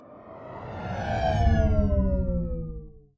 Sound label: Slow blip